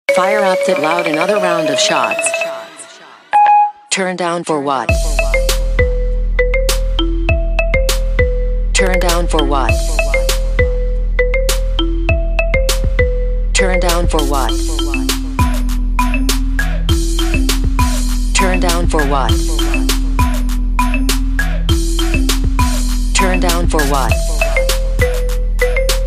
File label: marimba siri remix ringtone